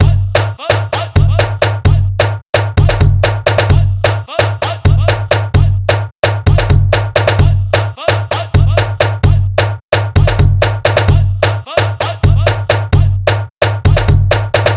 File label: BEAT